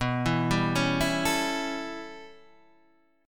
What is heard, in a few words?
A 7th B, chord; A7/B; arpeggiated; JGuitar